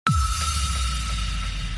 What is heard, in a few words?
ping loud